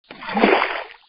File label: water splash soft